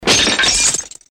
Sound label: destruction body impact